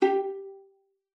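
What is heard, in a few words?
violin pizzicato G5; Xsample VOL-5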